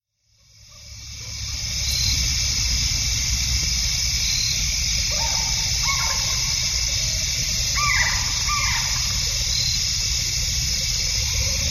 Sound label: Screech Owl